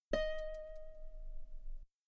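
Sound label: guitar D sharp 5 very long forte normal; bananenkeyboard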